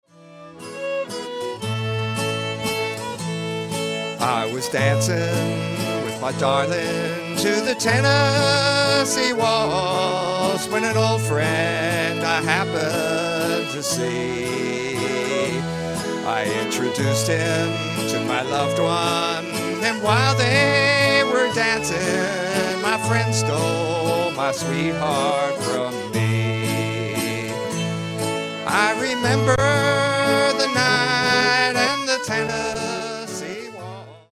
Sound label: Waltz